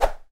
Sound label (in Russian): zvuk kogda razrubili vozduh